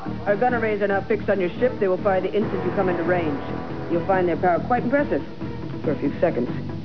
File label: gun array